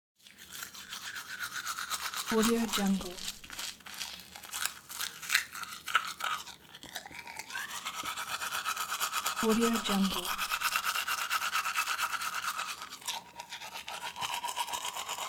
brush teeth; avsound96

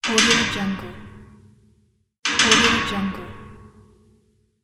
mystical metal

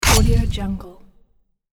fire sword

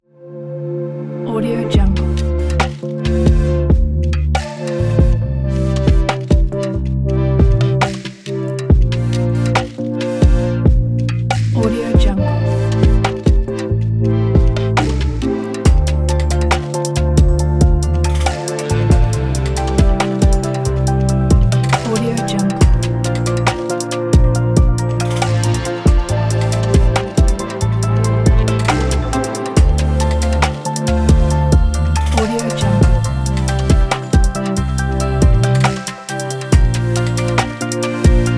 relaxing future bass chill

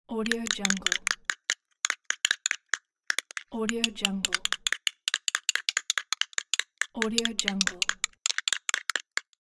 egg mixing

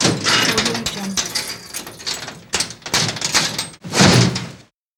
safe metal door open

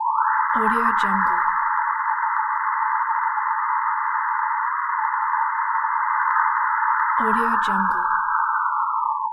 space signal

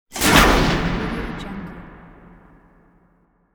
stadium spotlight

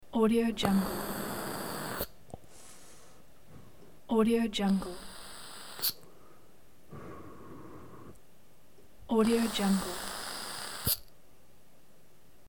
vaping smoking puff

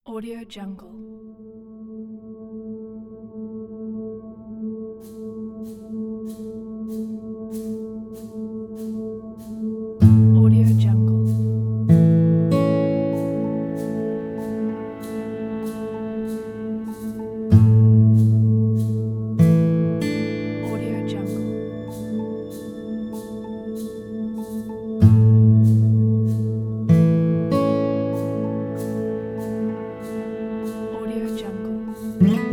relaxing acoustic guitar kit

